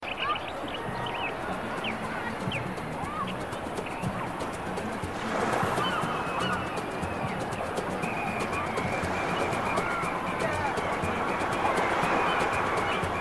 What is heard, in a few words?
Ambience Beach